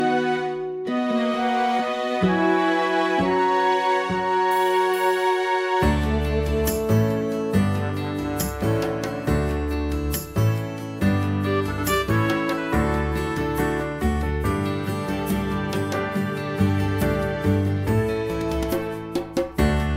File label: Instrumental; Young Fionas; academy players audition materials